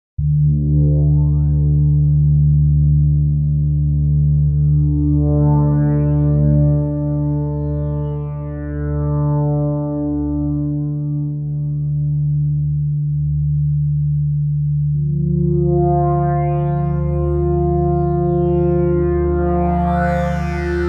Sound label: tones